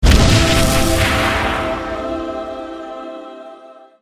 ultra powerup pickup

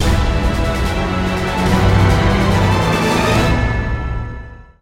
boss win

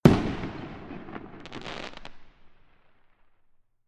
firework explode; TF2Ware

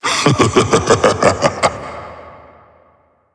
laugh; slender; meph

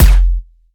catgun fire, weapons

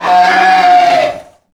pig chase; A Machine for Pigs

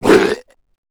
zombie vox male death; tf; slender; rave in the redwoods

